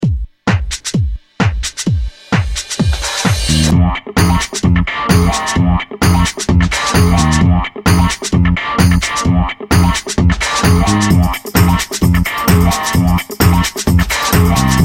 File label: funky ringtone